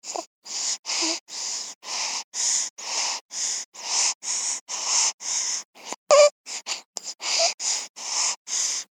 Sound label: baby breathing